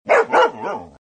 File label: dog